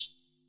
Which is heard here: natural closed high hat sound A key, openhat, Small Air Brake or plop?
openhat